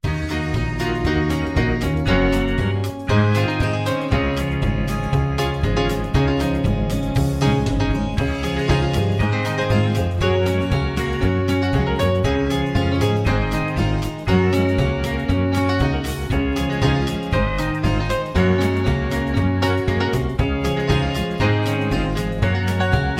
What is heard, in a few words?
High Key